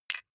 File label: medium metal impact medium scrunch